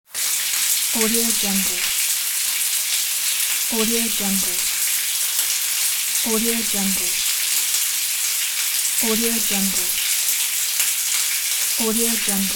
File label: tap water flows to the floor